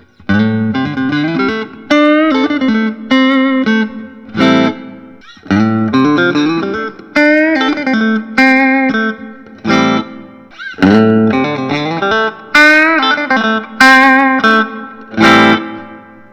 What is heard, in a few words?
fender custom shop rosewood thinline